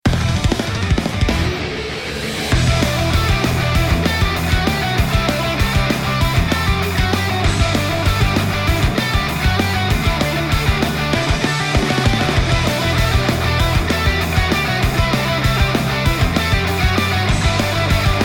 bass

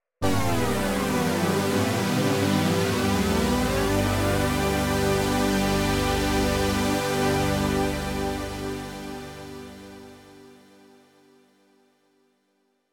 Ob-Xa V portament

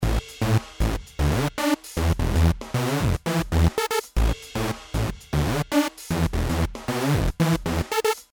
bass ghost

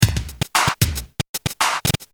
noise

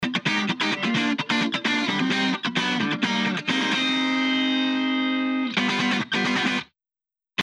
Guitar Cutting

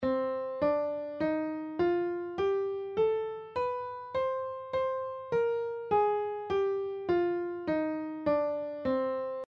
c mm scale

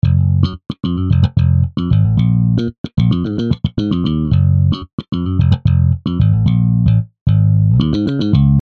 Bass

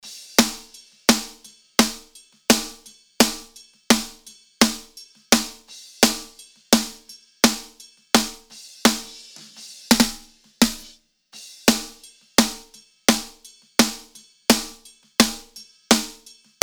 snare normal comp

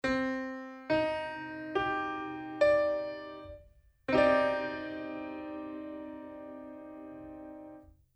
cmadd9

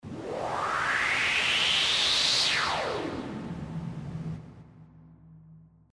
noise fx reverb

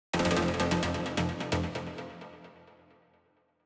Ratchet

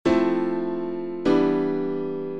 chord

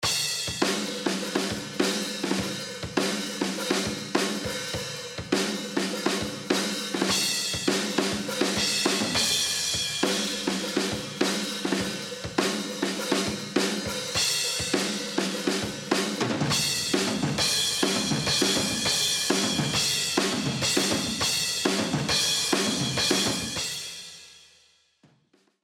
drums amb before

